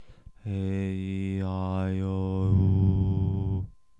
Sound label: aeiou Low